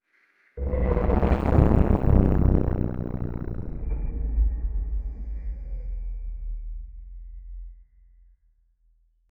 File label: death sound in void